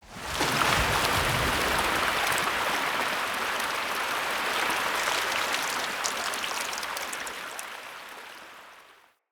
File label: Corrupted Sap Water Flow Short; Puzzles; BeanStalk